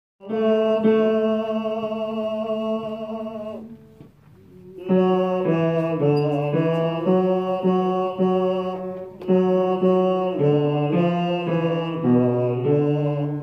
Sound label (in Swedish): bas